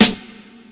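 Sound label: classic hip hop snare; Classic Hip Hop Mega Kit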